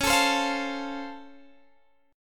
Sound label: C sharp Augmented Major 7th, C sharp, down, Guitar, Standard; strummed; capo 3